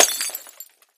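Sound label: Craft Vase Beads Crash, housewares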